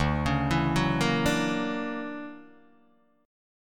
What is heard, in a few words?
D Augmented Major 9th, Guitar, Open D